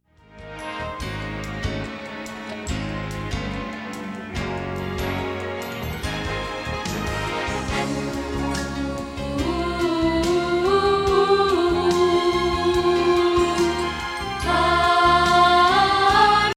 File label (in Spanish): Contralto